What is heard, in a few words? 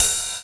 ELE RIDE, Drums Dance & Groove; USB Soundscan vol.11; AKAI; ELEC. KIT